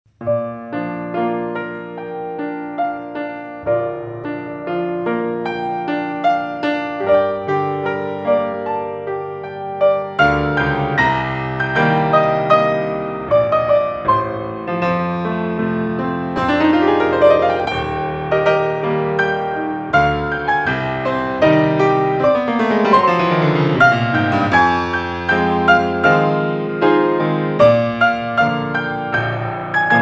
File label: Basic Bohemian Piano; DEMO; piano tracks